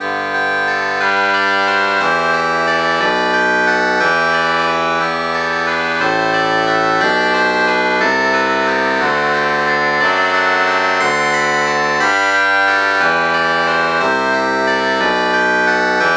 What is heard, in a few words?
shenai